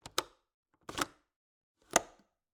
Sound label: Cabin, window, latch, flip, lock, 3 moves, hyper; Eiravaein Works; Vaeyan IV